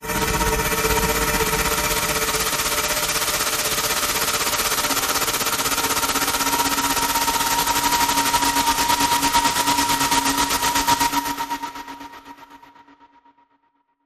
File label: Wormhole Sand Flutter